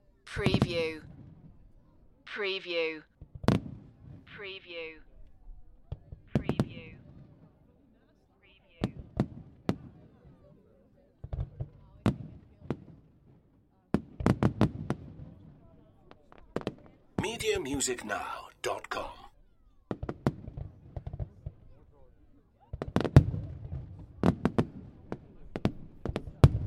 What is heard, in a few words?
Fireworks Display Grand Finale at end